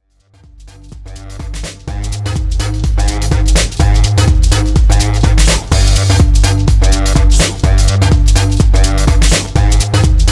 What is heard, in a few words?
Needle Drops; Fight Club Edit